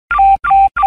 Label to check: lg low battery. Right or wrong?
Right